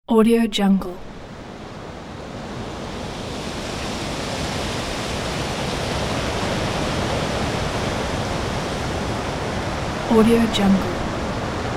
wind through heavy vegetation; sound ideas

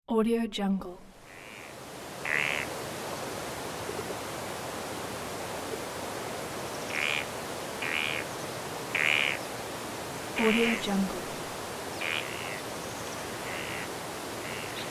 forest ambience

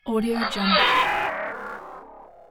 glitch impact

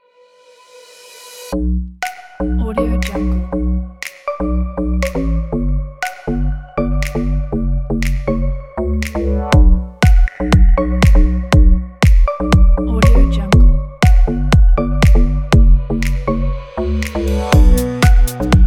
deep house

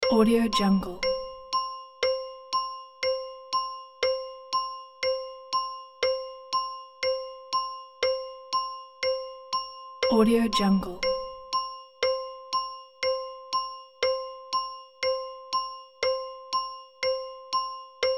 tick tock clock timer

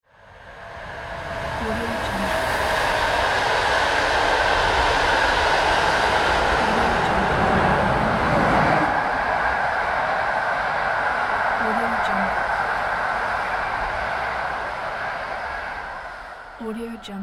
train

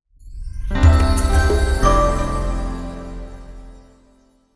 simple executive audio brand logo

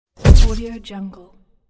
camera flash

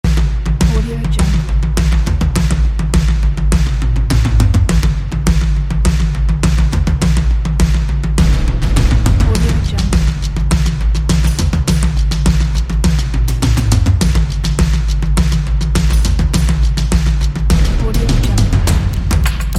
big toms dynamic percussion

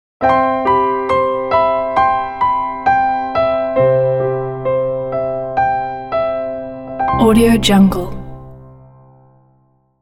elegant piano solo logo

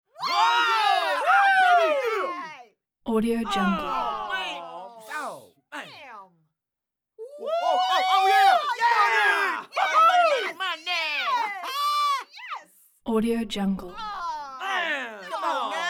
crowd cheers

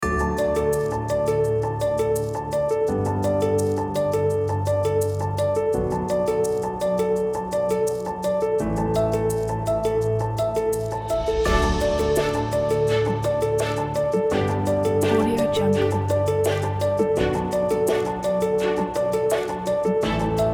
inspiring media; tunestogo

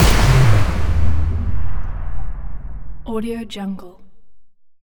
sinematic neon designed leviathan; soundmorph

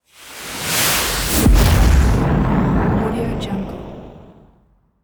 weapons missile medium fire stationary close

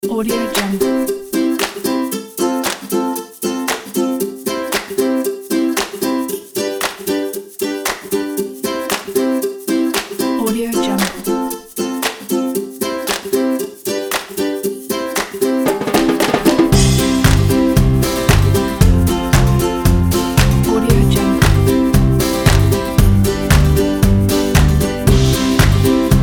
happy upbeat uplifting ukulele

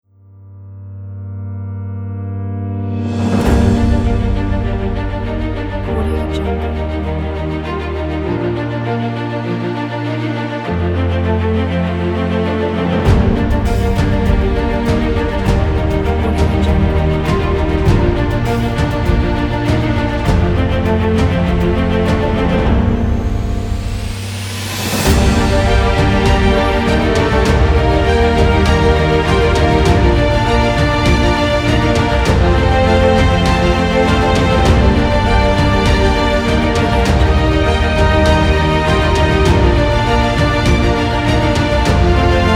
inspiring cinematic

